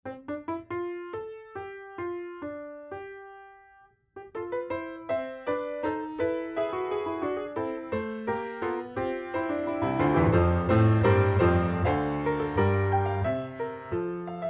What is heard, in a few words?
Piano